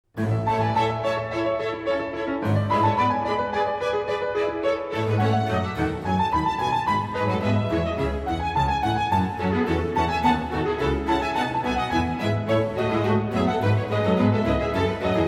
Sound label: Solo Concertos, Keyboard; Bach; Sondeckis